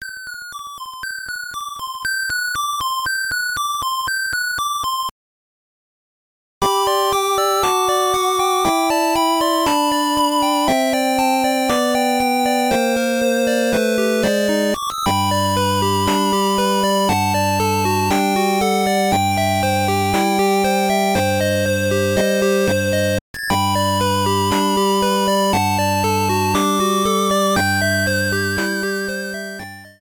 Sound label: Ending